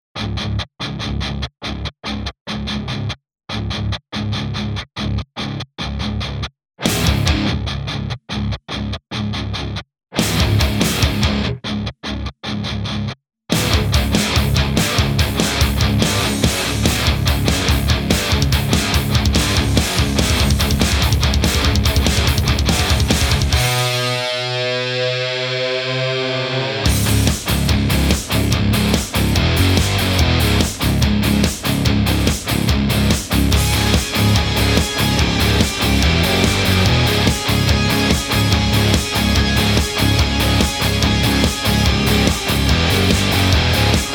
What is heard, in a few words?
super hvy